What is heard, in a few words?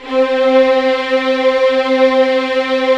STR Violins, Roland LA Composer; Roland - LA Composer vol. 4; 90 s Sample CDs